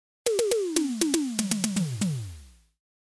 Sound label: simmons ultrabeat